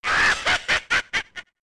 Laugh; Wizrobe

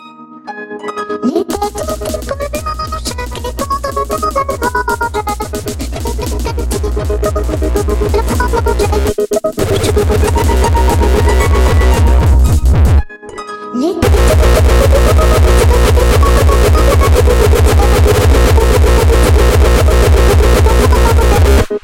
Frenchcore Lullaby

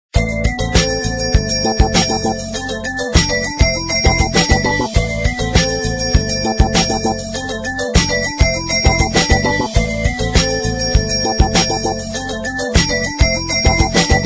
bells just ring just ring

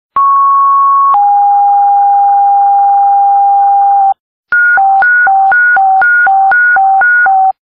EMS Dispatch Tone

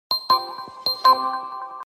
iphone 5 3d

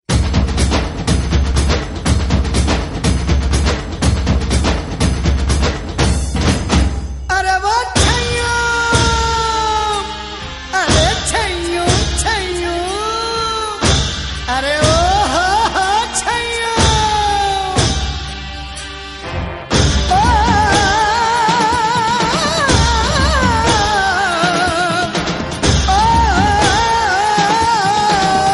dancing tone

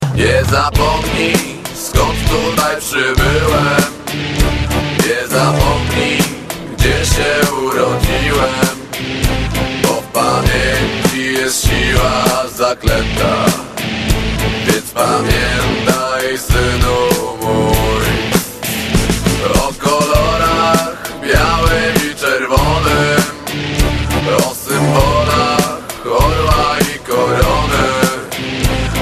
funky

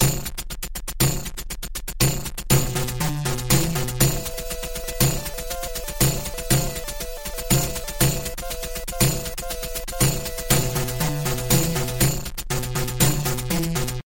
sms; blues

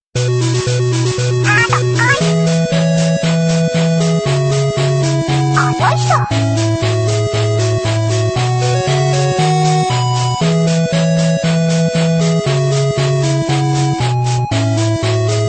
8-bit, ringtone

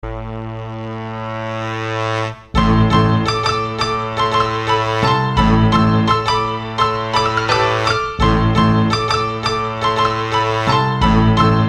Music Hip Hop

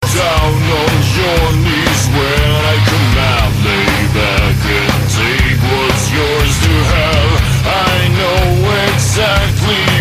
kid singing

